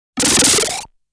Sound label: shooting sound